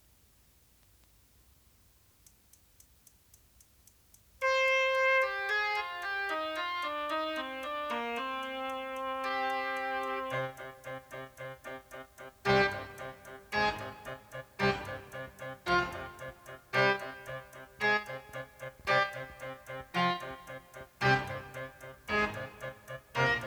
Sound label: Classical String Short